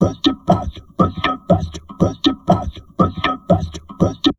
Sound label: TALK, Drum Loops Crazy Processed; USB Soundscan vol.07; AKAI